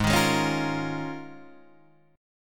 Eb 7th Suspended 2nd Suspended 4th Ab

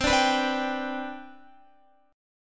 C Major 7th Suspended 2nd C down Guitar, chord; strummed

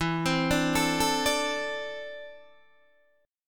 E 7th Suspended 4th Sharp 5th, Guitar, DADGAD

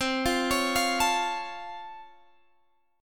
C sharp Augmented Major 7th, C

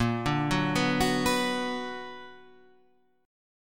B Minor Bb, Bm/Bb chord, guitar; arpeggiated

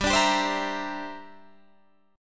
G 6th Suspended 4th Sharp 9th; G6sus4#9 strummed

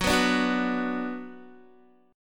F sharp Suspended 4th Sharp 5th, Guitar; strummed; F#sus4#5

